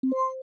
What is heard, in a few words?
Main Menu Cursor; Move the cursor on the main menu